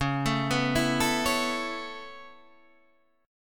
C sharp Minor 7th Sharp 5th C sharp Guitar Half Step Down; C#m7#5